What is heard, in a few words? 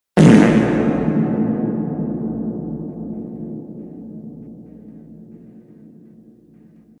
Reverb fart sound effect